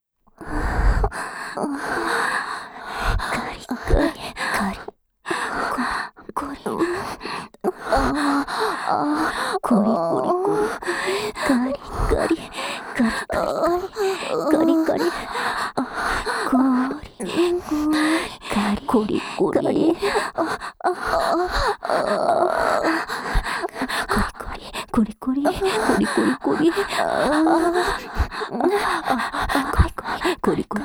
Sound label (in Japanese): 【両耳同時】かりかり・こりこり乳首責め＆メス喘ぎループトラック, ASMR